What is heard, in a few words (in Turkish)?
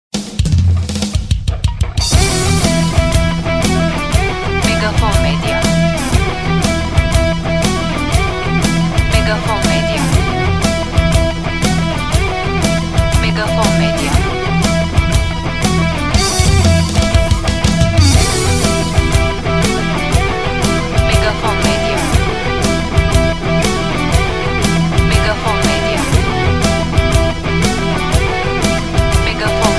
ROCK SEÇİM MARŞI